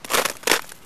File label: Snow step